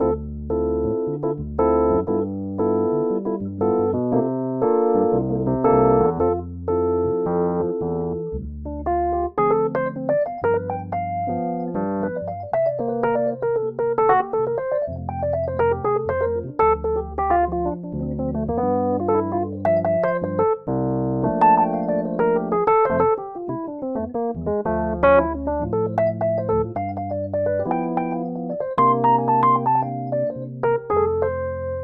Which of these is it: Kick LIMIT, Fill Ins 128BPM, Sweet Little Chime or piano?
piano